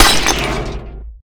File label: objects, World of Tanks